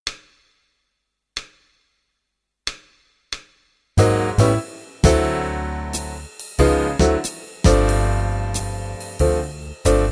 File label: jazz time